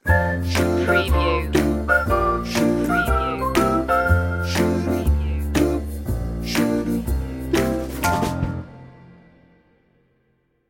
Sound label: fresh and fun stinger